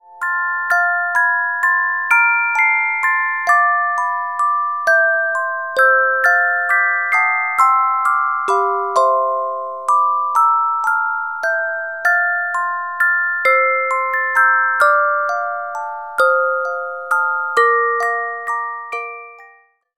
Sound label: Carillon Music Box Melodies